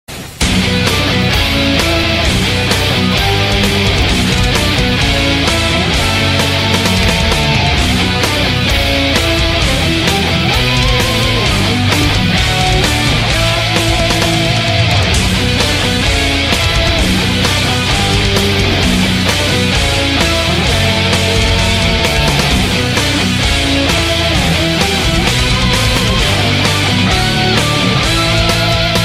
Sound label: Metal Mix; Robocop